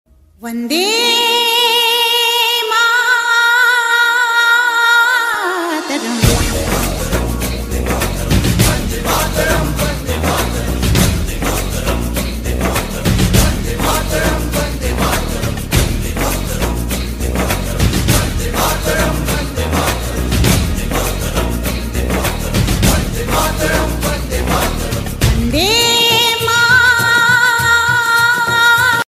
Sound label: vande mataram ringtone desh bhakti ringtone; 15th august ringtone